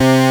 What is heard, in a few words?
juno chorus mid